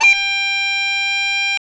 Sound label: pipes; Grahm's pipes; POP G